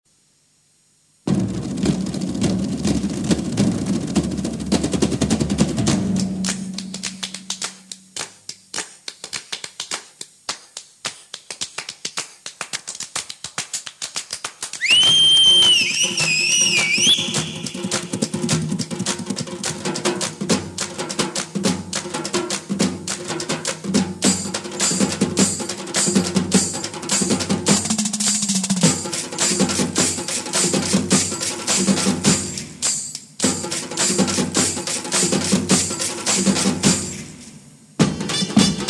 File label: Theme Music; Tamil Instrumentals